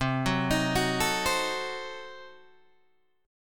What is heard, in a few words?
C Diminished 7th C down Guitar Open G